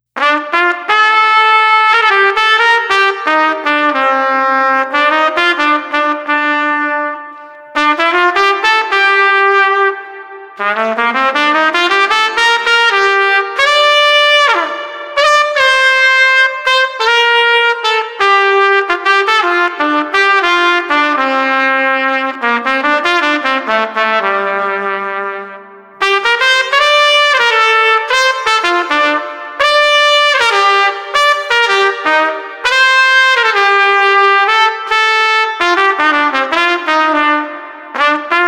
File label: Trumpet Downhearted 80